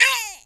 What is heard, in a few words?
oof; speech